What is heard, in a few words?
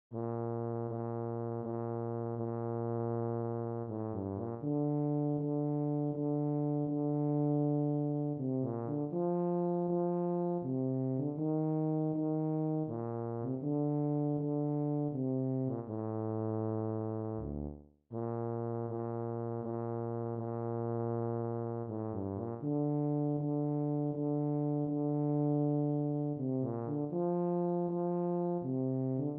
tuba